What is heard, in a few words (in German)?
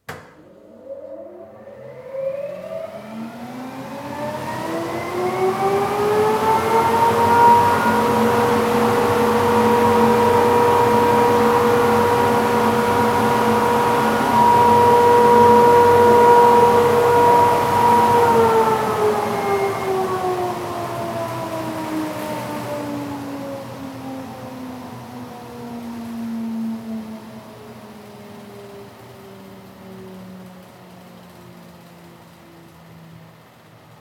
Belueftung Industrie